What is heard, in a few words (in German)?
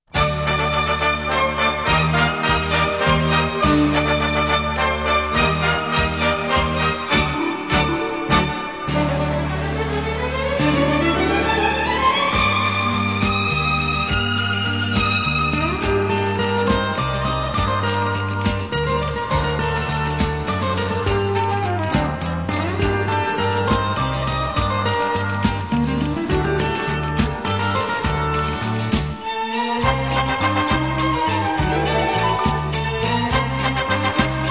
Andalusischer Tanz